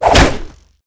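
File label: Attack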